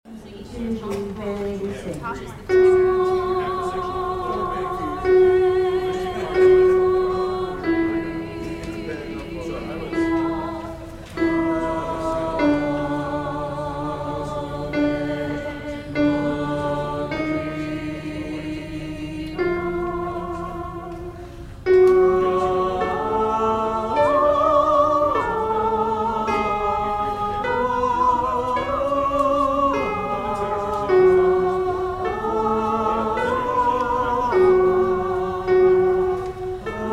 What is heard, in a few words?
Ave Maria alto